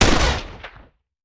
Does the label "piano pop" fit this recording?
No